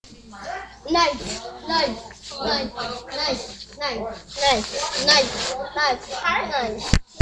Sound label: knife